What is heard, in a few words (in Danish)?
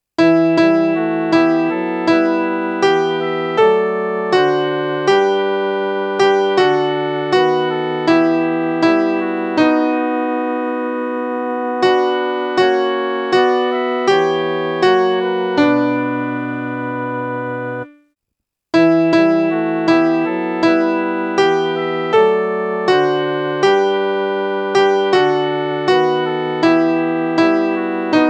midi